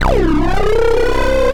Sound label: Decoupler Drop, Bass; Ableton; Skitter and Step